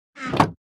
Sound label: chest close